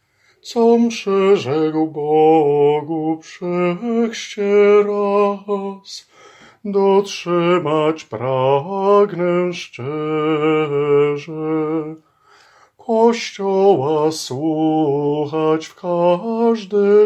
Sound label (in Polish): Com przyrzekl SOPRAN